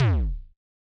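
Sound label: Bass Drum; Yamaha DJX-2B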